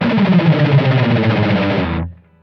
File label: Picked Slide